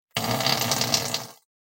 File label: Fart Noise; SoundsCrate